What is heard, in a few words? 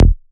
Ashes Bass F